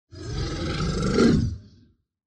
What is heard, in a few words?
Monster